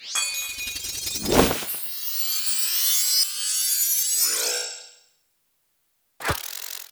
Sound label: Get Jingle - Key